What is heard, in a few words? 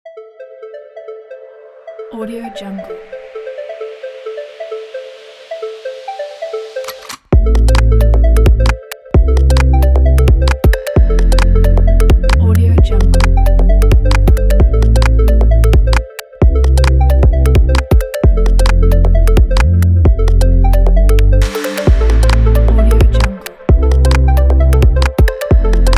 tech electronics